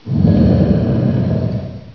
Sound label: ichy alert